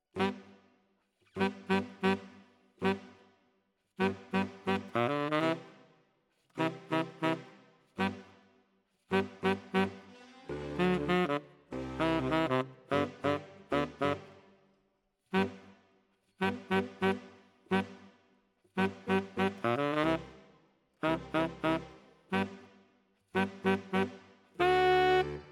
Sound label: Tenor, RNR for piano